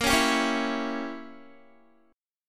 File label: Bb Diminished 7th Bb down Guitar; strummed; 4E,4A,5D,5G,5C,6F; Bbdim7